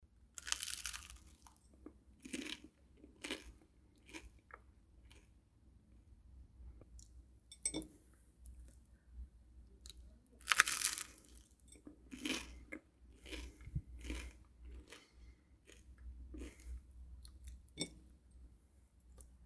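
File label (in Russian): two toasts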